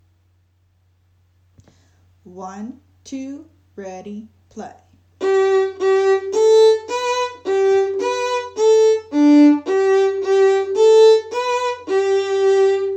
Yankee

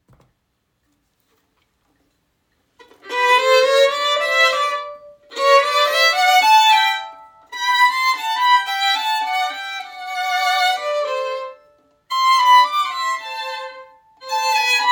Duet

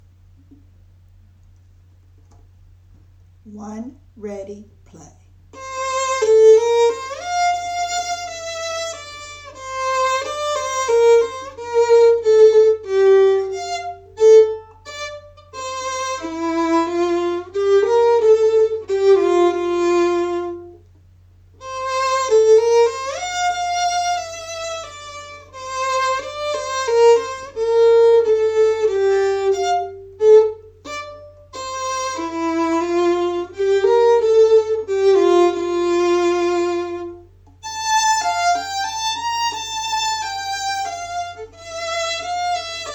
Andante

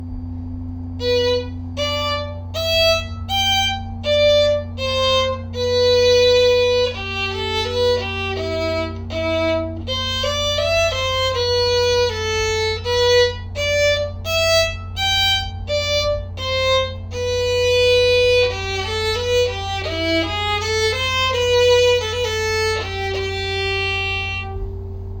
Gavotte; Becker

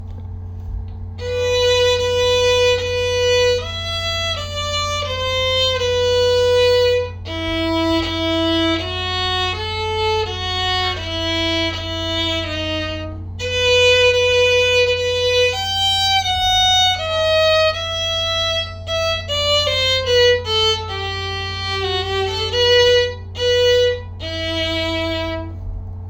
Gavotte; Becker

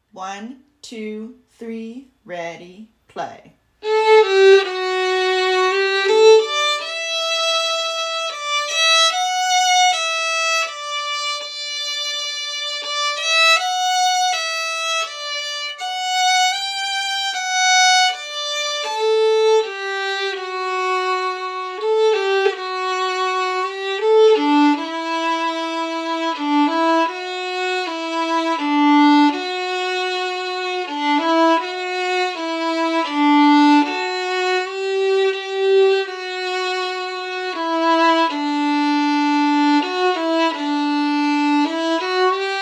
First Noel Harmony

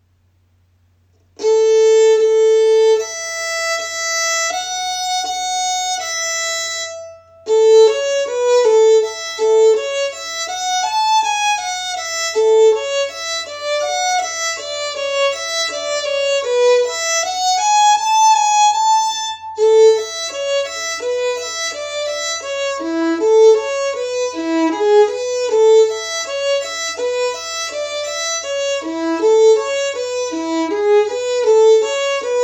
Harmony

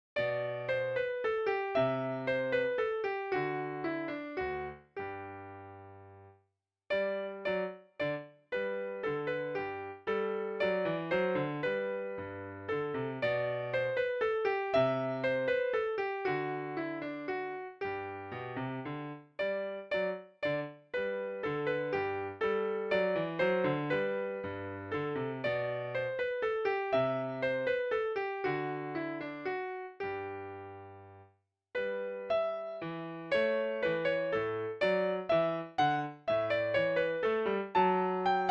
Minuet

